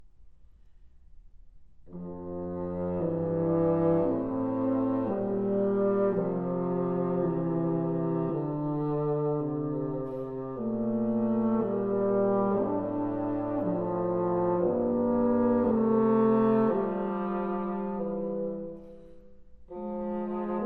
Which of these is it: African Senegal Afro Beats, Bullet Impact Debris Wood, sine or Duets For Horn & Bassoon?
Duets For Horn & Bassoon